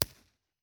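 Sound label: site 1 4 way mono, impulse response, Trollers Gill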